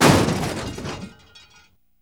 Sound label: TRUCK JUN, Transportation, Truck Junk